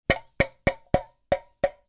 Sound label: foley food tin can lid empty knocks impacts